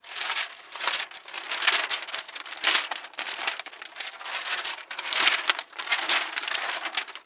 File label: paper loop